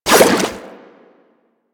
Aqueous Discs Hit; Warframe; Yareli

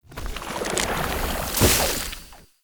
Jump Mushroom Hit; Puzzles; Bean Stalk

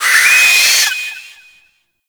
Infested Face Hugger Latch Start; Warframe

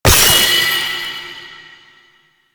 Horse Racing Orb Hit; Duviri

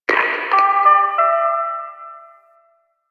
Chimes In; Cinematics; Warframe; The New War; Zariman

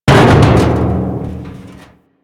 Metal Drum, Physics Objects

